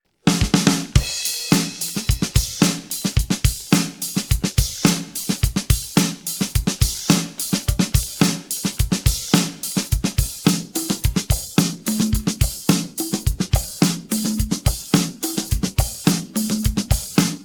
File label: drums pattern